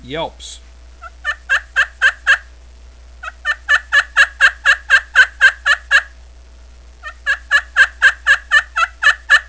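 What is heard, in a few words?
aluminium yelps, turkey; primos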